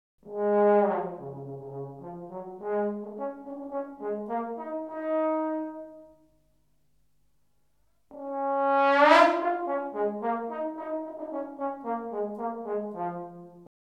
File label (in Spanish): Sonido de glissando en trombon; Instrumentos de viento; Viento-metal; Sonoridades especiales